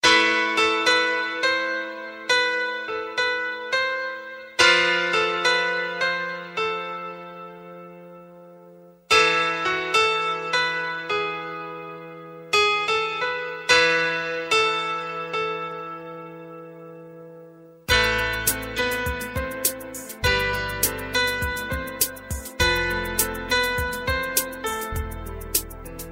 sad ringtone

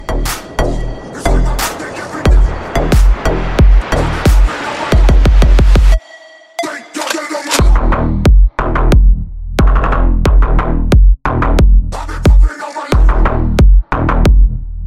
remix ringtone

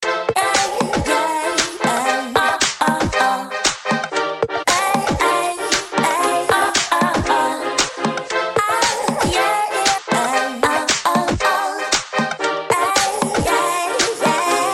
samsung s9 rubell ringtone

